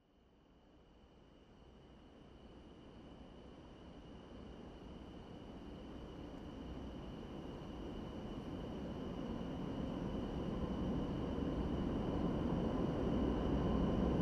Train Passing Loud

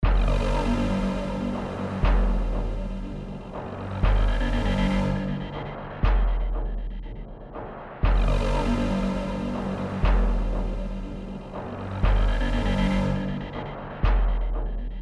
musical haunting

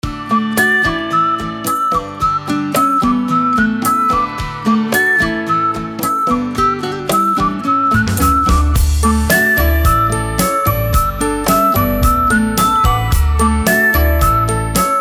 A Happy Whistle Song

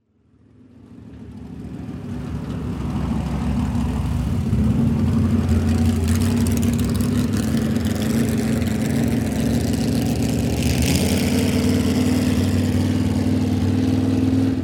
Boat Engine Taking Off

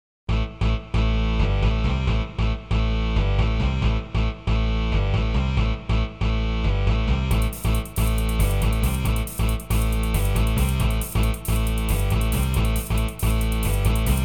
Techno Head; Soundzabound Volume 1

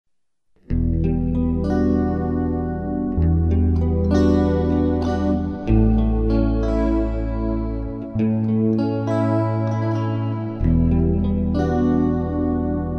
Ambient

